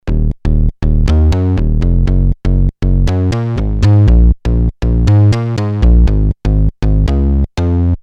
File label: modular, roland jd800; card demo